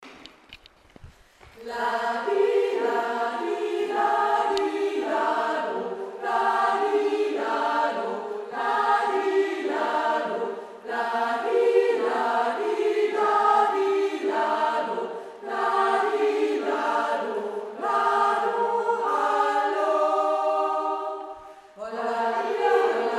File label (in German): begr ungsjodler